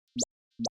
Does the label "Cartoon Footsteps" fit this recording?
Yes